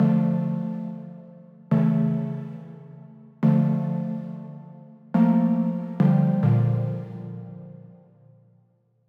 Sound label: mel brown one BPM140 key A